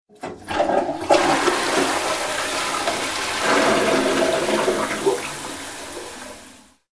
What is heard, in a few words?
Wc Sound